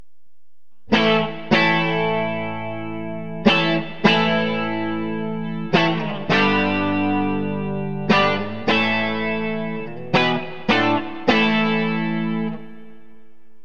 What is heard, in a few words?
bridge clean; pg; Burny